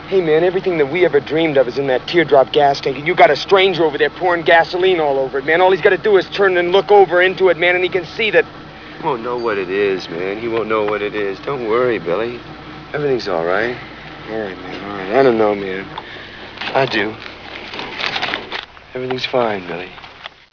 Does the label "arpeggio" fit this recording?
No